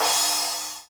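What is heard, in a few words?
Crash Upper, crash cymbal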